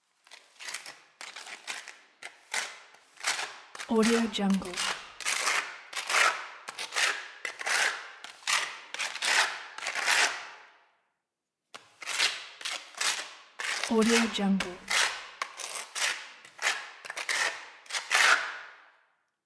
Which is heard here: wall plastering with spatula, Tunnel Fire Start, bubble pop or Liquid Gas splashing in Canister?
wall plastering with spatula